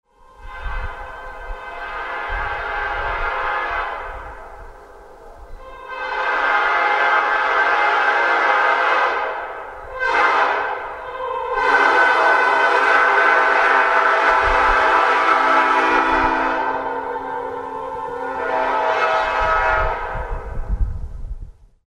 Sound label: horns